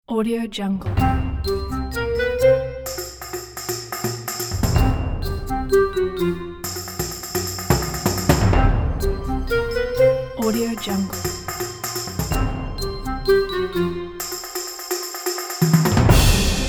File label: evil joke; eberlemaxim